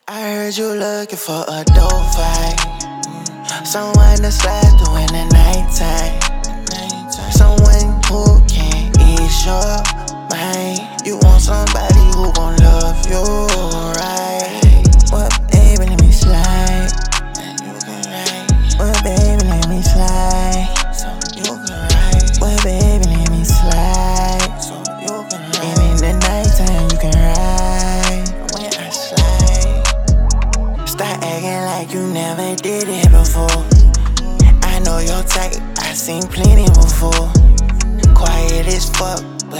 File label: HIP-HOP